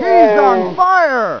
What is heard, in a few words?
SOLD DIE